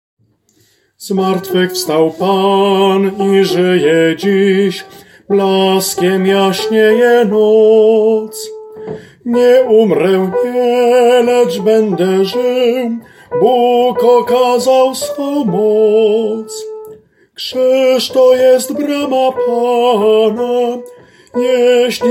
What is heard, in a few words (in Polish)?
SOPRAN; Zmartwychwstal Pan